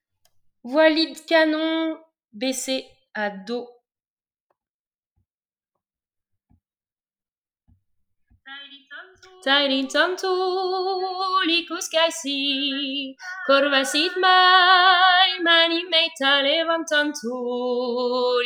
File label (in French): voix lead canon